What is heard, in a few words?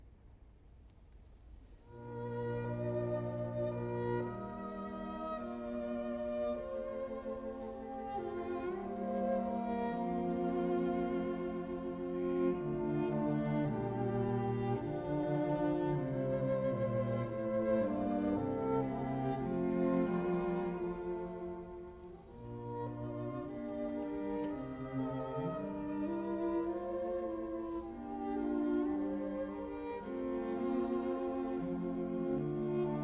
String Quartet No.1 in D Mvt 2 Andante cantabile; Tchaikovsky; Borodin Quartet